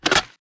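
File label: empty reload magazine pull; Titanfall 2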